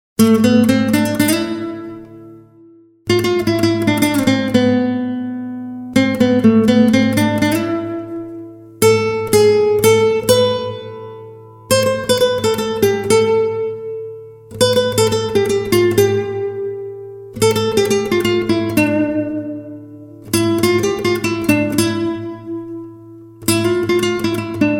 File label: Guitar Solo